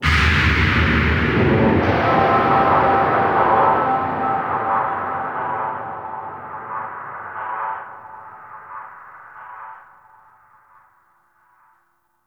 Exterior Deep Abyss Hit, Ambience; Warframe; Railjack

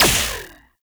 Laser Skin Printer Laser Start; Ambience; Props; Warframe; Entrati

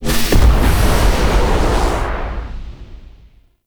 Void Dragon Floor Lava Pillar Explosion; Enemies; Gameplay; Warframe; Duviri

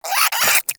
Cryptilex Vocal Attack Short; Characters; Warframe; Hunting Critters

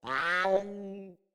Pet Meow; Enemies; Catbrow; Pet Ship

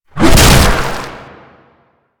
Infested Ancient Small Arm Swing; Enemies; Warframe

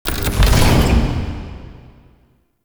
UI Mod Activate Generic; Warframe; Railjack; Player Ship

